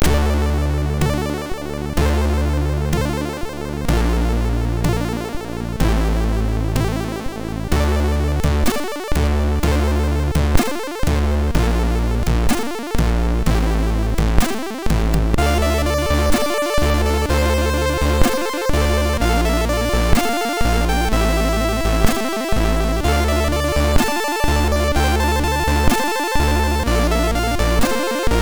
MOS6581R3, sid; Werthers Echte

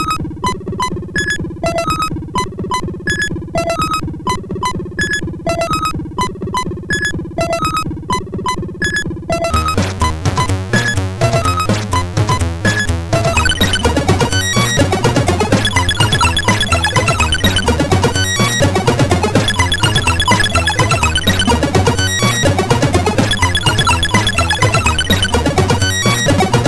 tune, sid, CSG8580R5

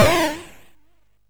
SFX, MOS6581; Narco Police; MOS6581R3

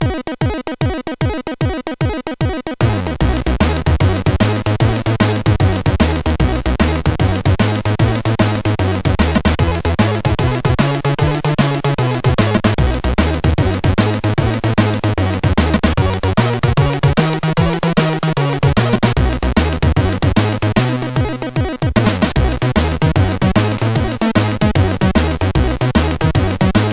Boogy Woogy, sid CSG8580R5

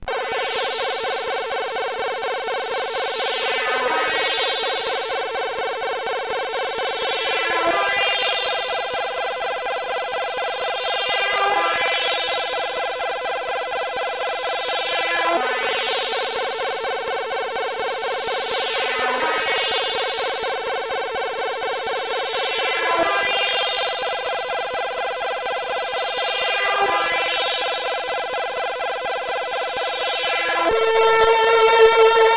Trebbler, sid CSG8580R5

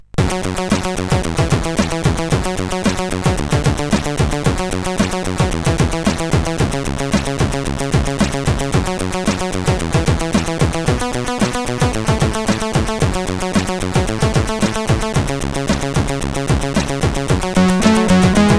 Spectrum tune CSG8580R5; Spectrum tune 2

